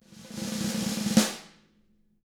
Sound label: snare roll